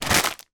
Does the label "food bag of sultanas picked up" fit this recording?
Yes